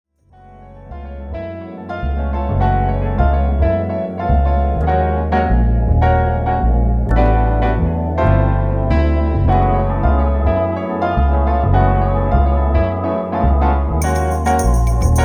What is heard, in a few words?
Flute